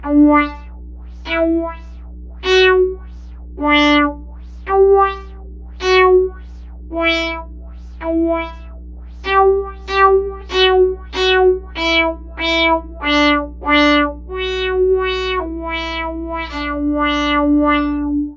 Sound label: wahwah